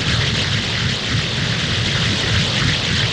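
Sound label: chakra spin loop; weapons; dota; shredder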